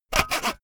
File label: sawing hard unsteady short